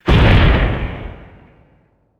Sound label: sharp popping explosion with airy decay; guns